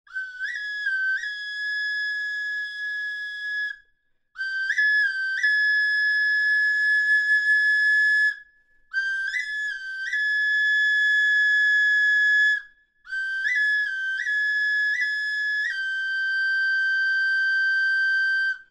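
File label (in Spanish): flautita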